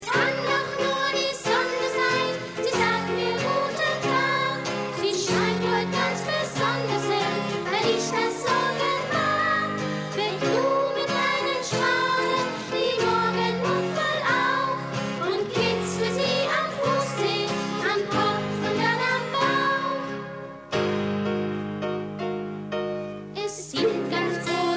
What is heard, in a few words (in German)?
sonnenlied